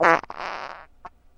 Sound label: fart bursting; Farts